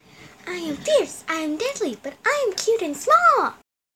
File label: lizard war chant